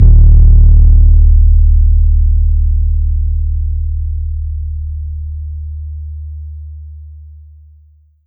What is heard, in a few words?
Kick House